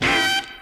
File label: HORN; ZERO-G; Datafile